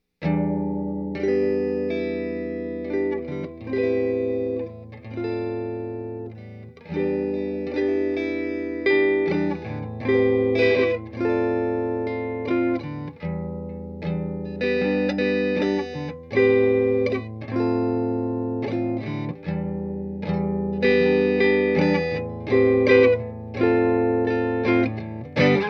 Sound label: Deluxe Reverb with Fat Cat 112 Cab with Celestion Alnico Blue